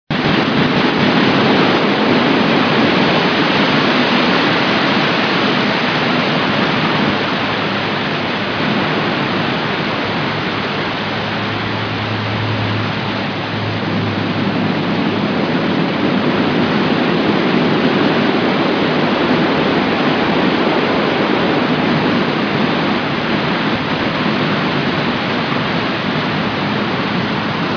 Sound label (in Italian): vento forte